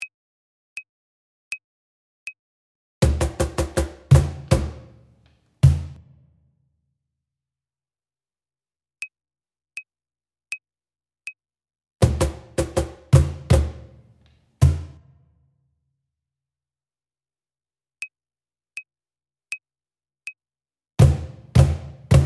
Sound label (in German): Samba Stopp